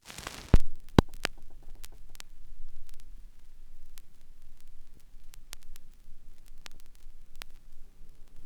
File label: vinyl; Masterbits; Vinyl Attack